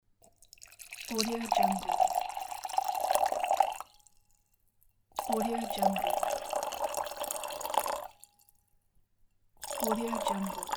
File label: pouring water; flicksbros